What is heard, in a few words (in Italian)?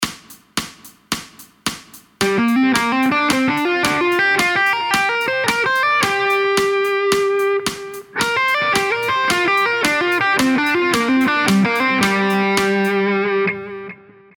Pentatonica minore terzine